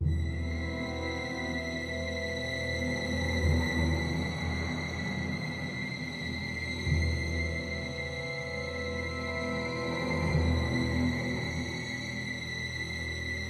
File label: Suspense; Game of Thrones: A Telltale Games Series